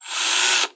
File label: drill fast short; SoundsCrate